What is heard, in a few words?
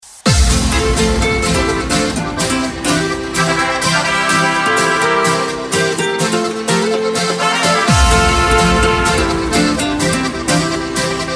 karaoke version